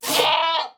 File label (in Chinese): 山羊尖叫挤奶声